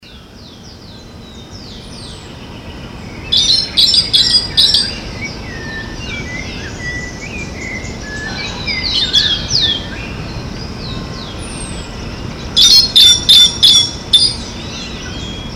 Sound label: Turdus subalaris; PN Itatiaia; Silveira